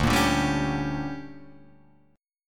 D Major 7th Suspended 4th Sharp 5th, Guitar Drop D